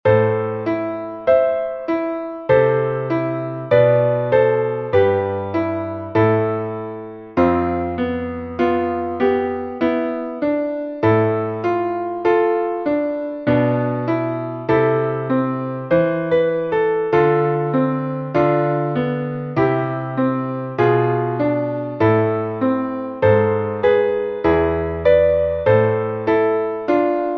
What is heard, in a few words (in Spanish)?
entonacion piano